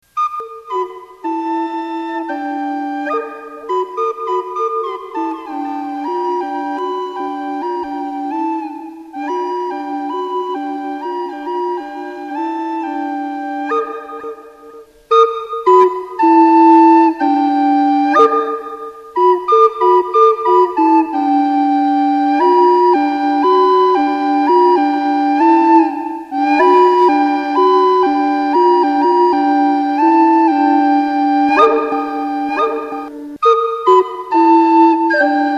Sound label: recorder echo